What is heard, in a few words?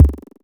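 Kick one shot; Sonic Foundry (Sony Creative Software); Club Joints and Street Anthems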